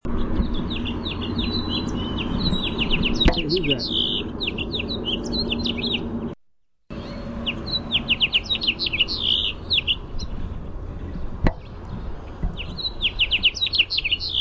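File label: House Finch song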